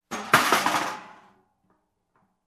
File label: Closing loose metal lid